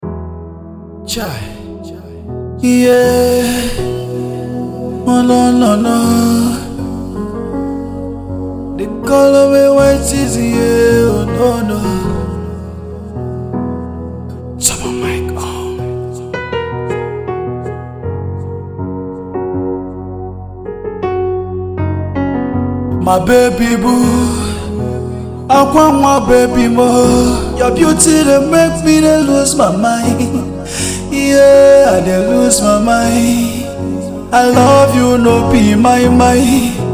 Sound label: Acoustic